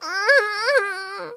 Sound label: whine